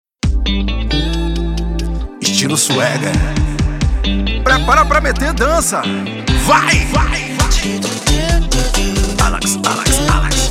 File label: beat